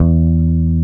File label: PEDAL STEEL